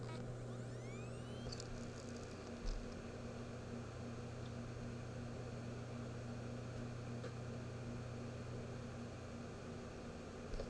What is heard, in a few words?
hd sounds, laptop, bad; mac disasters